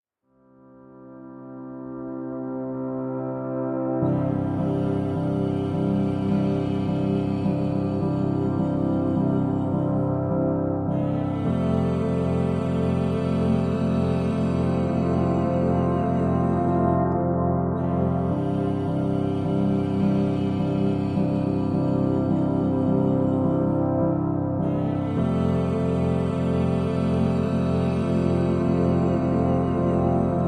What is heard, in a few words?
No Piano